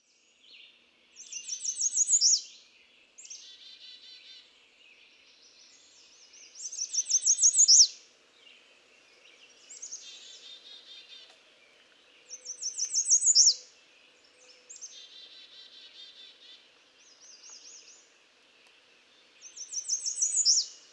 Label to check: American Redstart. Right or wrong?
Right